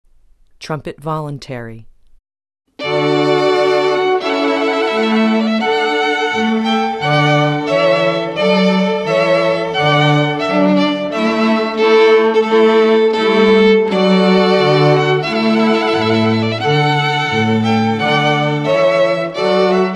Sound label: trumpet voluntary, string quartet